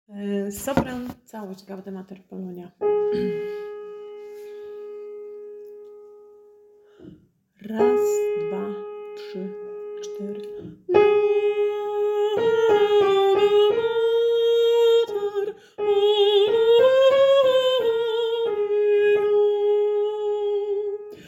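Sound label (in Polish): Całość Sopran; Gaude Mater Polonia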